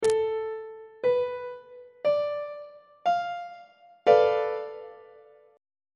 d minor 6th 2nd inversion on treble clef

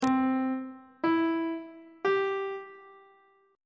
c major chord 1 is c major on treble clef

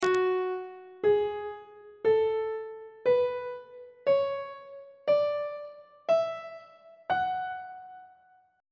f sharp minor scale note positions on treble clef

